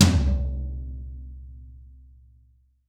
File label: PR16TMAMHL, drums toms; Best Service; Real Mega Drums VOL-1